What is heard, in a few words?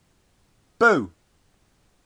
eeeeeeer